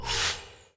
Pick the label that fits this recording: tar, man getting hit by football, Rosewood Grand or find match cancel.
find match cancel